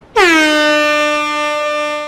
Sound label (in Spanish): corneta tiempo